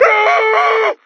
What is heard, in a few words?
Muffled Scream; DENNIS; Stonewood